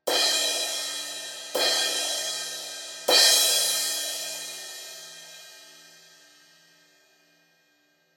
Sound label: 18 I Crash hits, cymbal